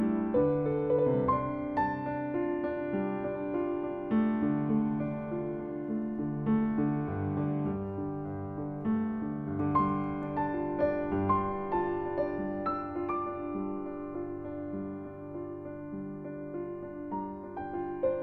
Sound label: Ballad